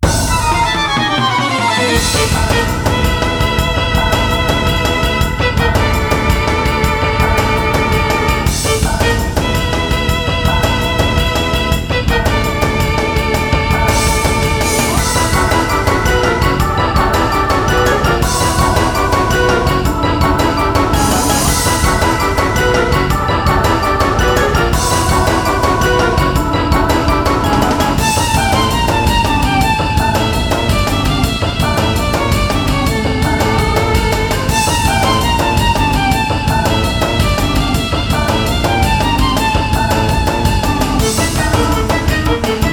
Bgm battle

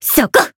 attack, jp

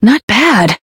damaged

